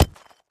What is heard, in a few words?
Concrete Chunk Drop; rocks & dirt